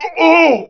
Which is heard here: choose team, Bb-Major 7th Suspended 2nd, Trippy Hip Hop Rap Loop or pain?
pain